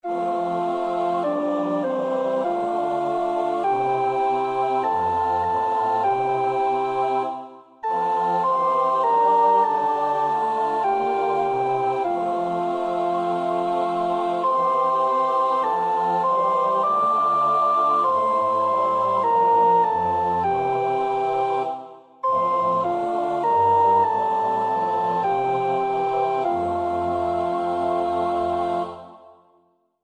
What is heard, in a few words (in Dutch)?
sopraan; Oefenbestanden